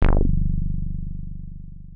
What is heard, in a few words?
bass